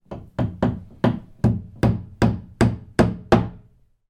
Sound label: industrial hammer nail into wall next door